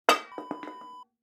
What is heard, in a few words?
lid drop